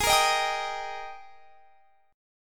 Ab Major 7th Suspended 2nd Ab down; AbM7sus2 strummed

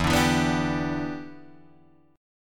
E Minor 7th, Guitar, Drop D

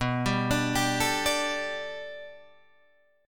B Minor 7th Sharp 5th B Guitar DADGAD, chord

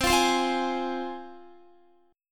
C Suspended 4th Sharp 5th, C, down, Guitar

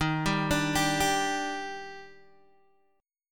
Eb Major 7th Eb down Guitar Open D

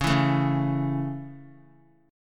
Db Minor Sharp 5th Db down Guitar; strummed; Dbm#5; JGuitar

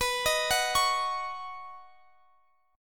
B Major Add 9th, Guitar Standard chord; arpeggiated; x,x,21,20,19,21; capo=2

